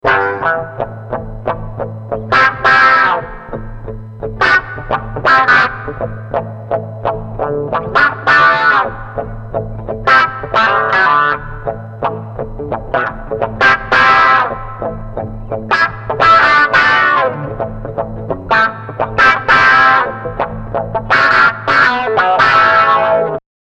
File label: Funky Auto Wah